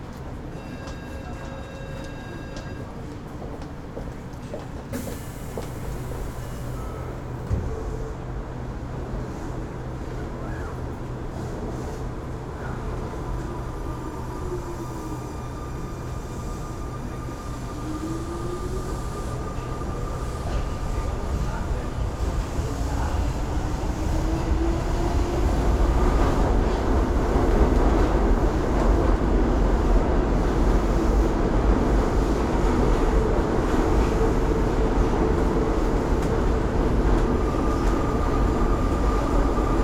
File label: Urban train ride; urban and suburban; boom library